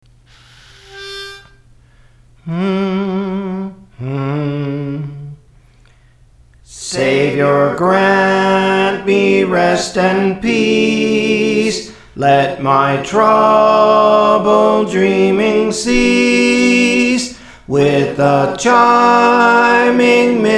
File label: Soprano, Praise For The Lord Training